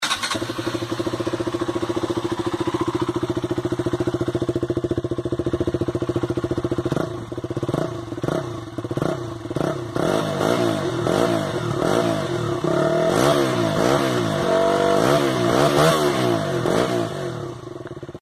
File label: ktm duke 200 engine